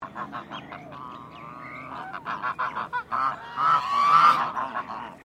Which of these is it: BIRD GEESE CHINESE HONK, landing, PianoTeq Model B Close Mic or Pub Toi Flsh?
BIRD GEESE CHINESE HONK